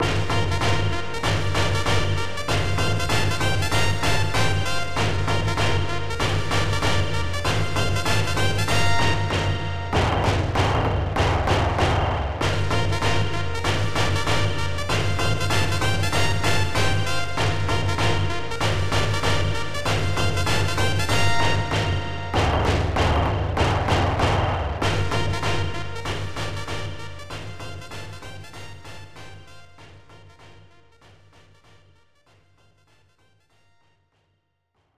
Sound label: Hitting March